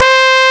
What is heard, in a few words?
kik horn, Amiga Soundtracker Sample; ST-XX Amiga Soundtracker Sample Packs